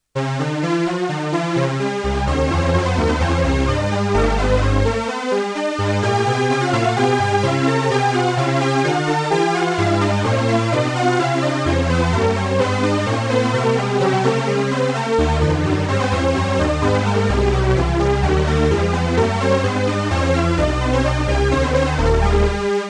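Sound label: seq bach, analog polysynth; Solton SM100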